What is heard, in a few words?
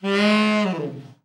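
TENOR overblow FALL, Sax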